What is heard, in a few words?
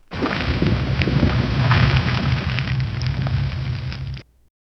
heavy pounding thuds echo; earth